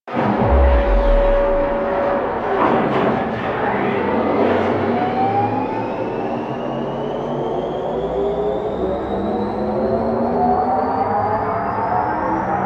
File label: Orbiter Engine Start; Ambience; Warframe; The War Within